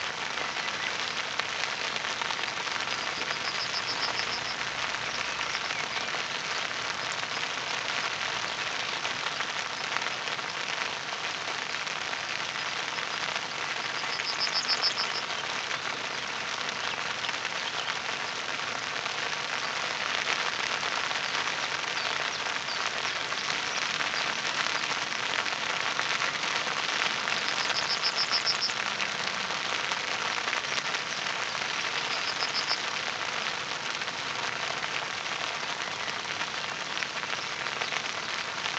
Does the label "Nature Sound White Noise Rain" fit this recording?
Yes